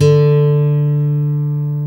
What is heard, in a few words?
GUI Ac. D3, GUIT AC; Best Service Gigapack 2